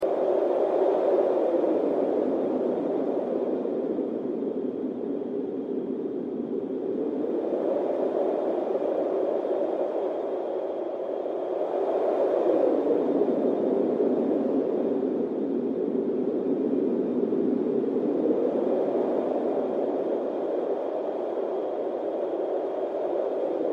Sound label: sand sound